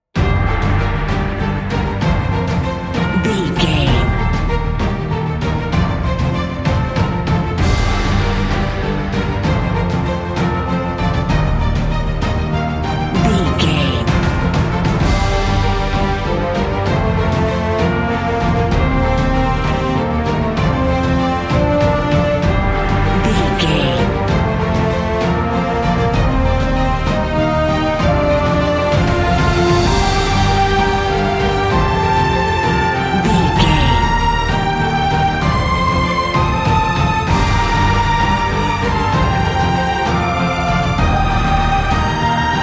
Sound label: epic cinematic